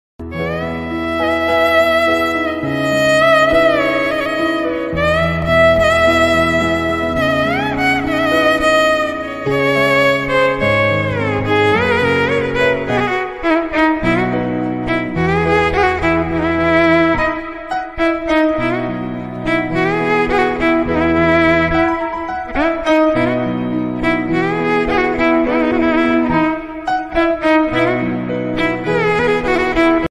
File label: violin bgm ringtone violin ringtone; thalapathi movie